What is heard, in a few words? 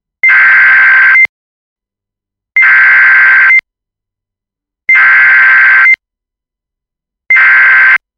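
us police panic button alarm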